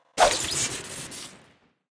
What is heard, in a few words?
shovel swing crit, weapons; tf